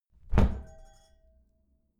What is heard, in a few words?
glass door close heavy glass clang